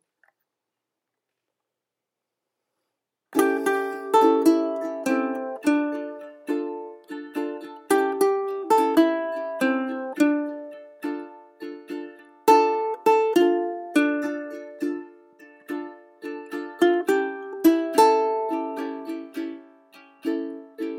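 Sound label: with melody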